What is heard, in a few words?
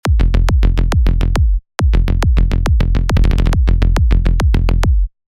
blah blah blah bass